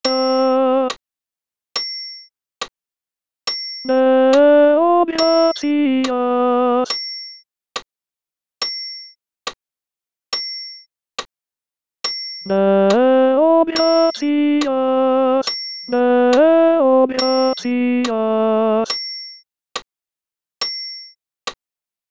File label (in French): Ite missa est ten voc seul